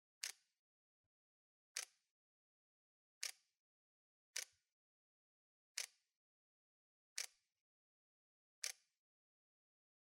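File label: Nikon z6, camera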